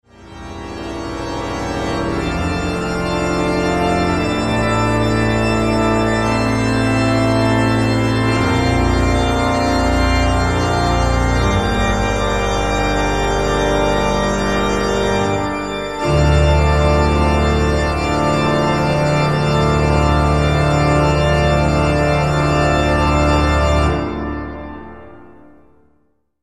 Pipe Organ; Bach

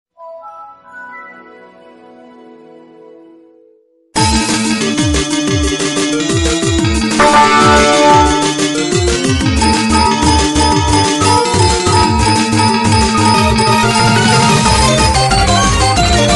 Chilled; windows exe

